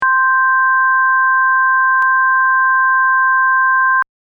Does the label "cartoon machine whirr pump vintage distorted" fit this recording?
No